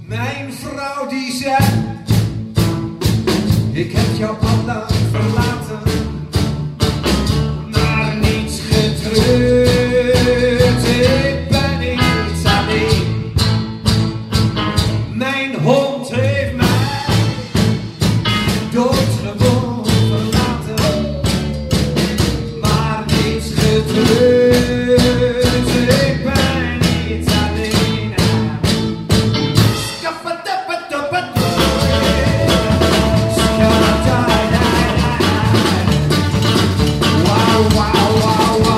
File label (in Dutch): Treurnis tango